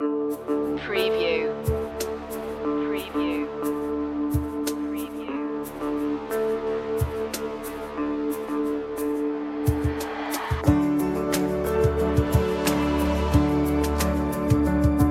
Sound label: inspiring beauty